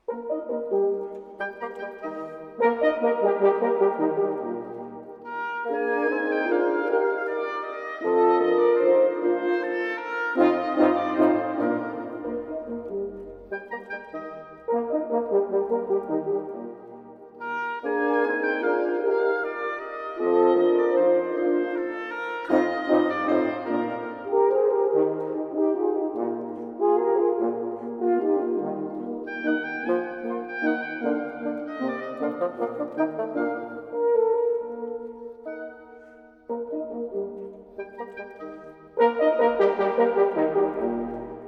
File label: Quintet for oboe, 3 horns & bassoon in E flat major Minuetto; Hess 19; Ludwig van Beethoven; Brilliant Classics; Music for Wind Ensemble II